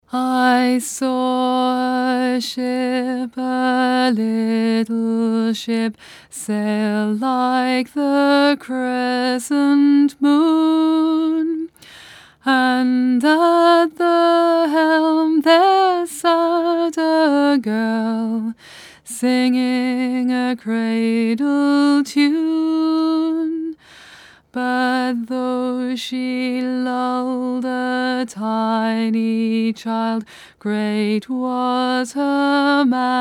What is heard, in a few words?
tenor